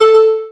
Music

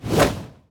Grain shot; Folk Hero

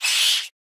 Damage

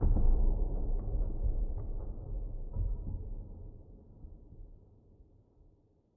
Room placed low

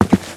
Footstep Walk; Starbites

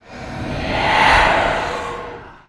Ghost Die

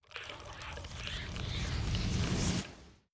Charging Spell; Hollow Knight; Shaman Charging Spell

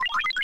Beep-Blueprint-Place

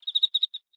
Env Void Insect Sing; viewfinder

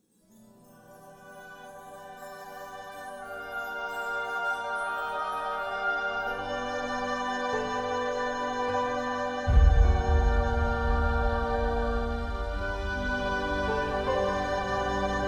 town music

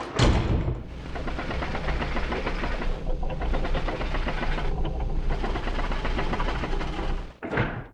Weather Disruptor Up

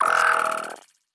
Field Aggro Start

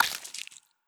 Futa Harras Bite

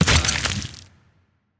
Pistol Launch; Pistol Hero Head Launch1

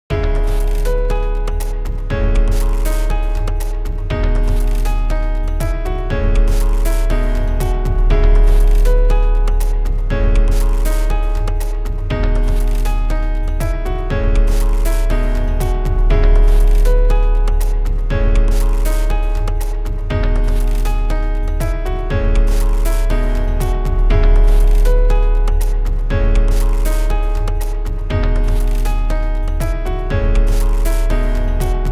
Ending; Vampire's Melody 1 2

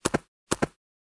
Ambicorn Run

